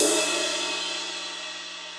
Ride Edge Room With A Vista; Drums; Drum Hits; Standard Hits; Ableton; Session Drums Studio

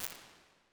accoustic space, Medium Rooms, IR Samples; Convolution; NI Kontakt 5

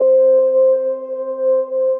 Piano & Keys; Nocturne Le Pris

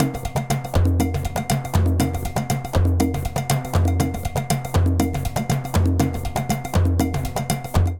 Baion 120bpm, Latin Percussion; Ableton; Factory Packs

